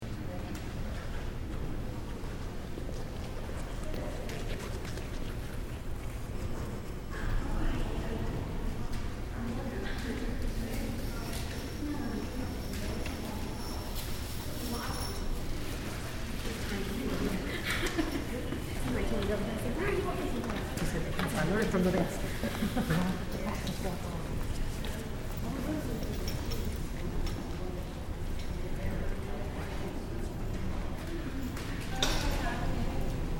Library Interior Light Crowd Walla In Library Hall; Urban Spaces